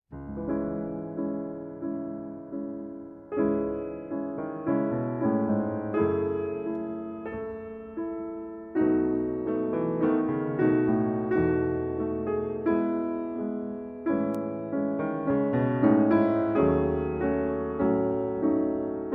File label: piano solo; muzyka k filmam; glavnaja tema